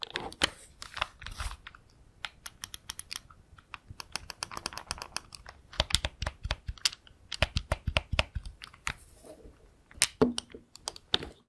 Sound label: GBA Button Pressing ASMR; Captain Vita; Foley; TRUTH-HZ; Crowdsourced Sample Pack